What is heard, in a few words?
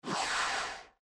bounce